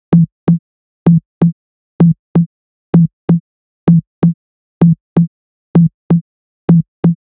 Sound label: TOM LOOP 128